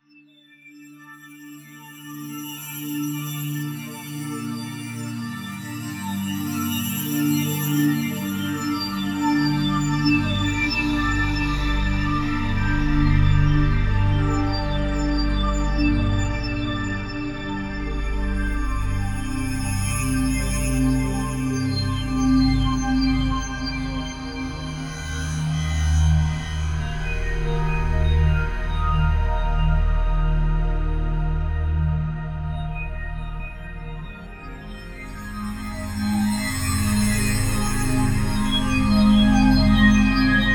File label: Roland VSynth